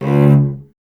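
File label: strings cello C#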